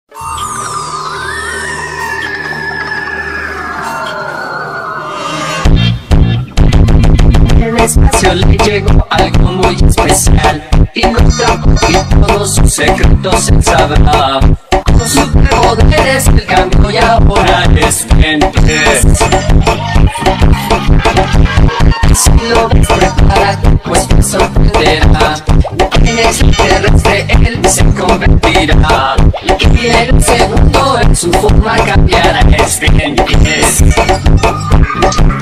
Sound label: phonk brazilian; ben 10